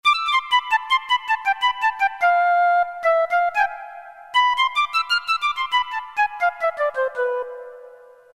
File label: Piccolo, Roland MT-32